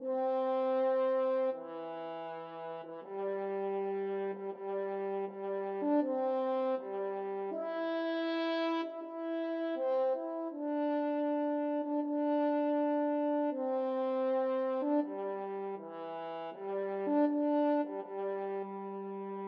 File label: Overture brass 5tet